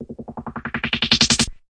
filto roll, DRUM LOOPS and BREAKS, FILLS and ROLLS